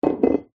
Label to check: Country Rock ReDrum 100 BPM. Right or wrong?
Wrong